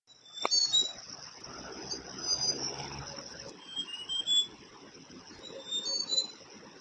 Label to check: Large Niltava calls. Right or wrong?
Right